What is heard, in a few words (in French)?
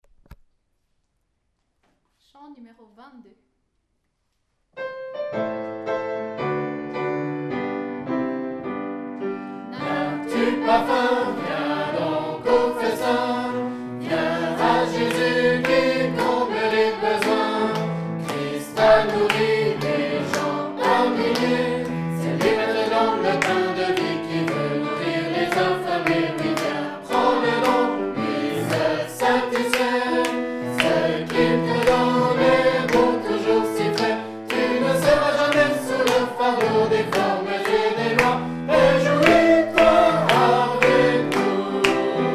Chant; FR